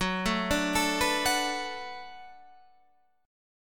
D 6th Gb, chord

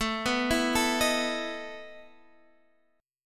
E Major 7th Suspended 4th A

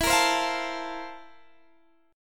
C 13th E

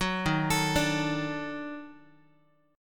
D sharp Minor Major 7th Flat 5th D sharp Guitar; arpeggiated

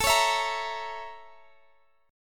A sharp Minor Major 7th Flat 5th A

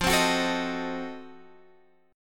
F 7th Flat 9th F Guitar Half Step Down

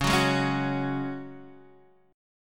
C sharp Suspended 4th, Guitar, Drop D

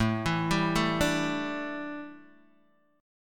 A 7th Suspended 4th Guitar Open D, chord

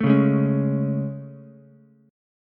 A Major 7th A, Ukulele; AM7 strummed; JGuitar

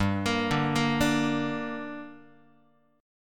G Minor, Guitar; arpeggiated; x,3,1,0,2,1; 4A,4E,5A,5D,5G#,6C#; JGuitar

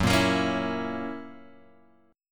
F sharp 7th; F#7 Chord {2 1 X 3 2 0} strummed

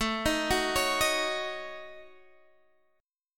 Eb Minor 7th Flat 5th A

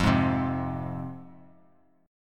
F Minor, Bass Standard; x,8,6,5; Fm strummed; JGuitar

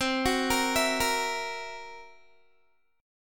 C 7th Flat 5th C down Guitar; C7b5 arpeggiated

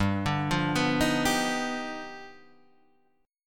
G 7th Flat 13th; arpeggiated; JGuitar

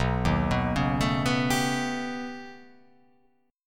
B Augmented 9th B, chord

